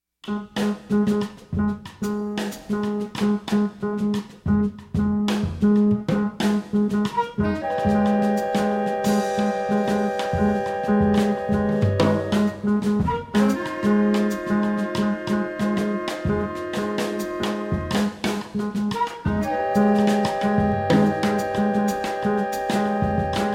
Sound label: NINE OVER REGGAE